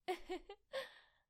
woman laughing

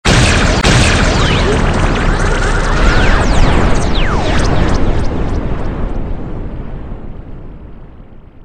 double laser bomb attack; black mischief

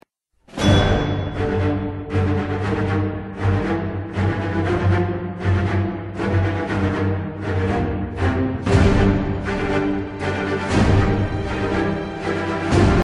dramatic music; avengers

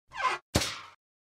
among us vents sound effect; among us imposter vent

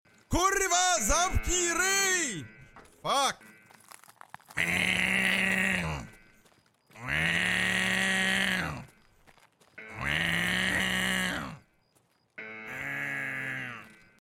alarm

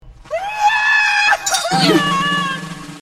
king pig crying

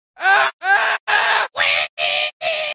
portal fall; Portal Fall- Tobuscus

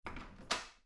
doorknob turn open

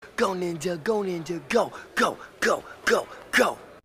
rap; ninja

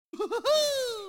wohohooo

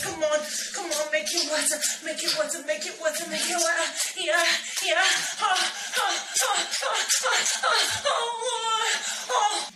moan

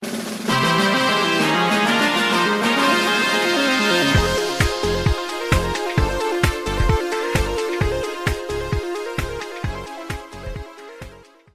victory fanfare; crisis core final fantasy vii

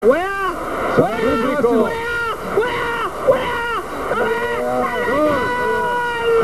weah weah weah weaaaaaaahhhhhh

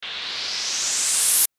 woosh

